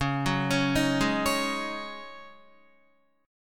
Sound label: Db Suspended 2nd Suspended 4th Db Guitar; arpeggiated; 9,9,11,8,8,8